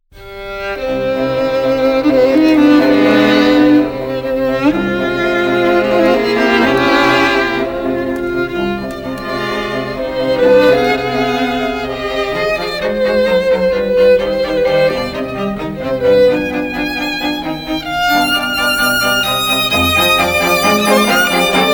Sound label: strings; beethoven; bakuage 7db; mastered